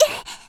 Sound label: attack; Eternal Return; Tia; Voice Line; KOR